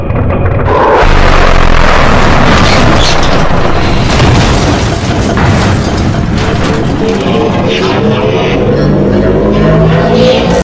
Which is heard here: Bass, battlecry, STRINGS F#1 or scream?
scream